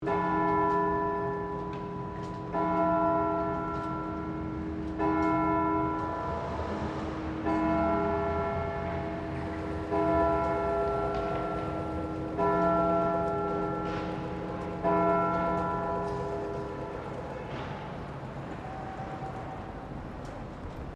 Ambience Sydney Town Hall Clock Bell, Sydney's Town Hall clock chiming; Building Sound Effects; Foundation1